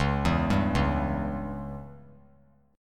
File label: C sharp Diminished C sharp down 3C 3F 3A 3D; C#dim arpeggiated; JGuitar